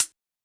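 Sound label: Hi-Hat Closed; Yamaha DJX-2B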